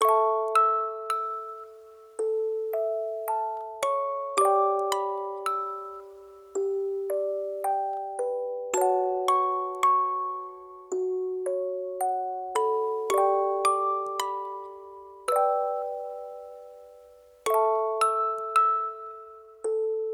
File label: video game music box